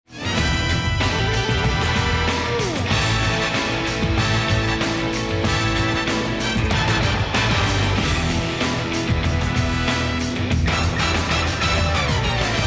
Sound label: choose team; music kits custom